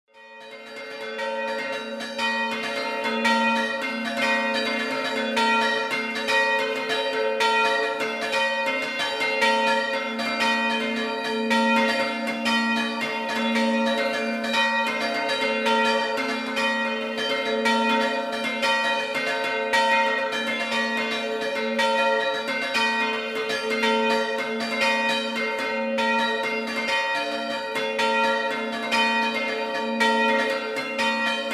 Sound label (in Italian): scampanio ovasta, campane